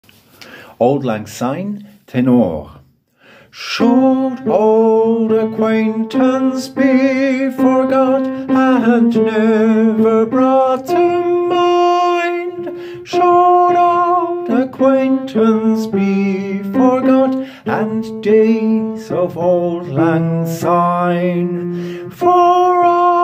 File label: Tenor; Auld Lang Syne